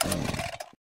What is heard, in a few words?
saber start; sith